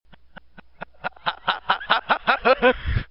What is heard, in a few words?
laugh; lodge